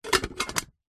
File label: medium small sized metal stockpot cooking pot empty lid place on; household; zapsplat